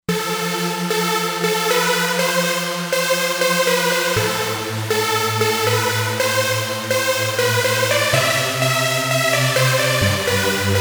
Trancy

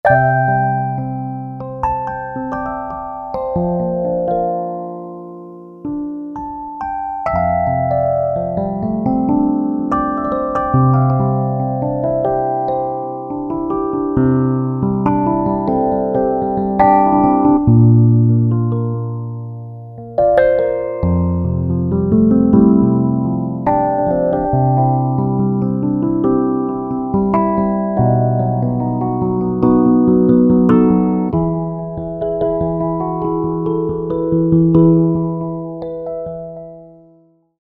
PH RHODES, Roland SC-880; Factory Patches

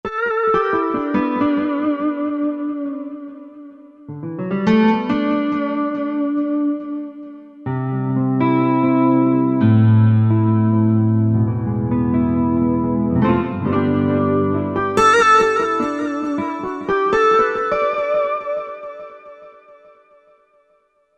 Soft Guitar, Korg MSC-11S Brass

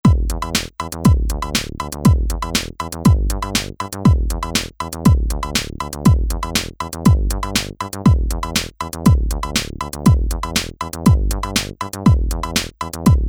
House

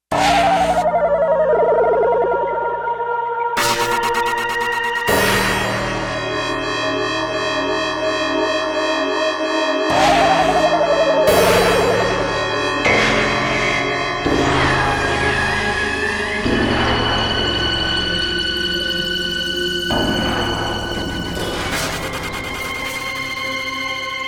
FX Menu

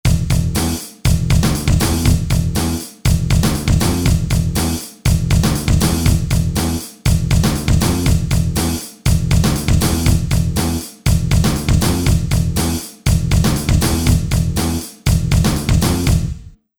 Metal

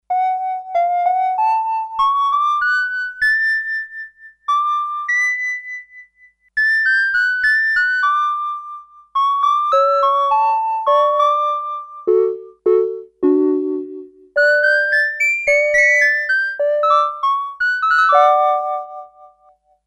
Flutey Pose, Sequential Circuits Six-Trak; Factory Programs

